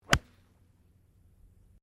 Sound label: Golf Swing Iron Divet; Sports; Various Golf sounds